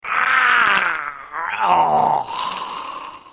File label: male shout